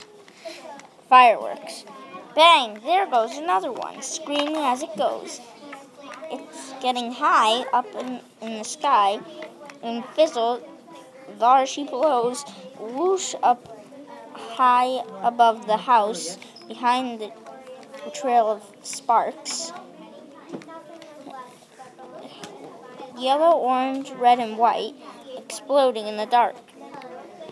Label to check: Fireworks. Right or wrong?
Right